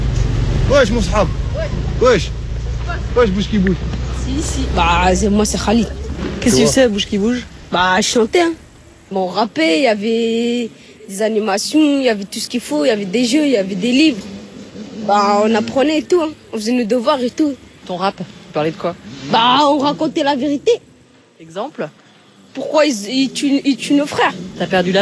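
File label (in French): jeune rappeuse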